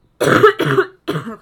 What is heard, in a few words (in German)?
Husten Femail